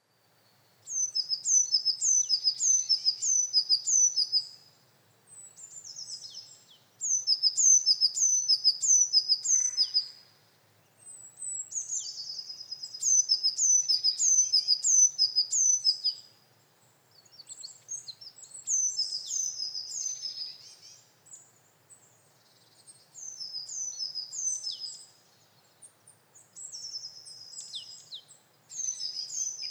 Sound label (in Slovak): sykorka belasa